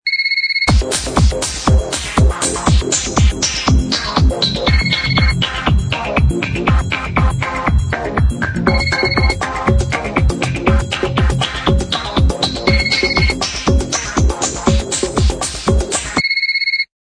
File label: Ring Rhythm, phonetones; Asus P526